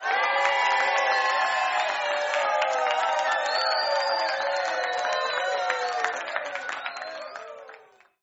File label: Cheer